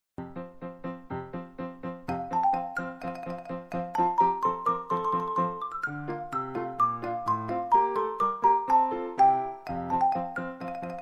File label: tambourin